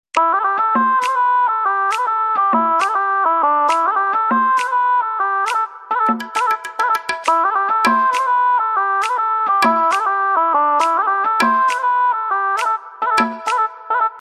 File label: Instrumental BGM; Dabangg 3